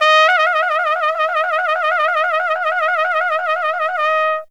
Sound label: T D# LNS, TRUMPET FX; Zero-G; Phantom Horns